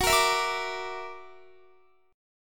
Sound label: F sharp Augmented 7th F sharp Guitar; F#+7 strummed; x,21,22,21,22,22